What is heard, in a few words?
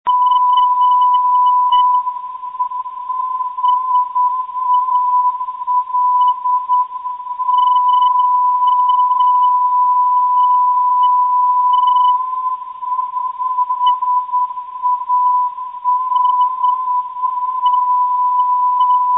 sal 274khz, ndb